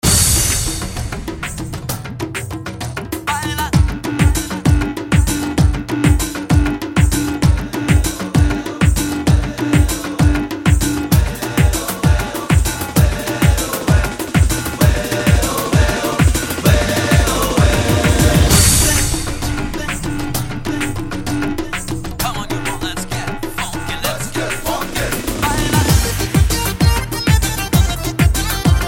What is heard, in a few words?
Djembe